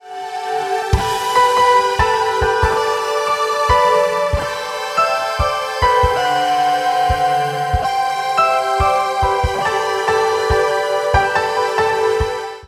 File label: Church Melody; church melodies volume 1